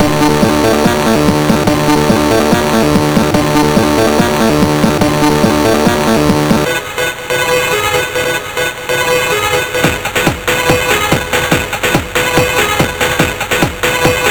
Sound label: Mix, sid, MOS6581R2